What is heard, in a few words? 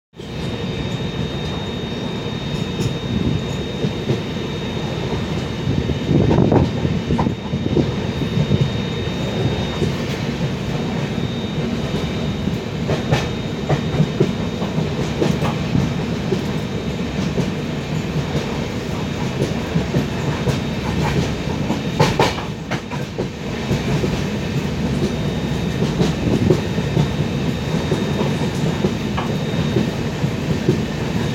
nellai wap7 honks accelerates outside; Tirunelveli; Deepavali